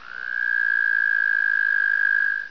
croak long

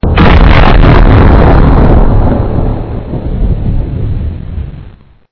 mortar hit

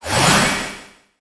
heal

